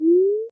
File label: number grow short